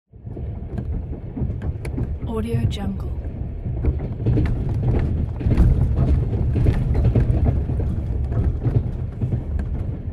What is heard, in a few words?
train door open close